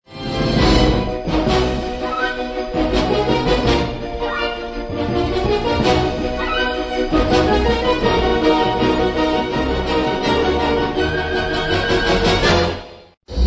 trailer music; santa clause3

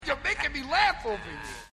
Laugh; the wild

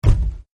thud

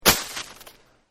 snow impact; phineas and ferb; snowbrawl

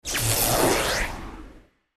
zap transition; kritter krawler

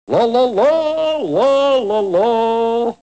La La La; Goofy

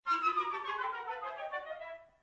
music descending; snow white

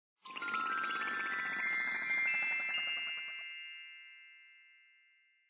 level start; fairies

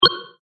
generic click; Wreck-It Ralph

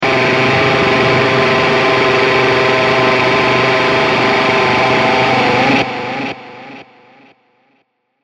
lawnmower

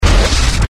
item gravity; disneyxd; stuntastic

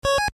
snake capture; toystory; woody

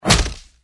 crash kick; disneyxd; stuntastic

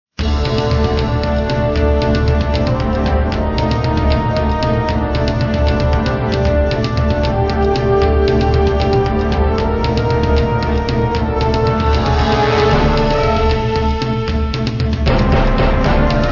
music; disneyxd; pirates cannon assault

